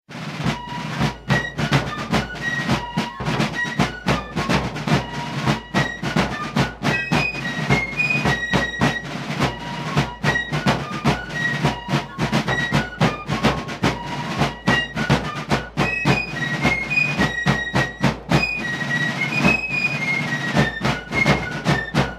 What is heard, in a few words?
drum & fife; Gettysburg